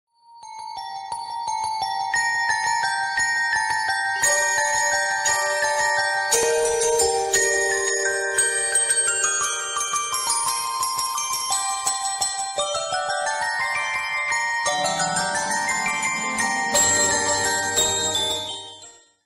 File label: carol of the bells ringtone